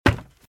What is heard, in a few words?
Footsteps - Wood - Creaky - Run - Right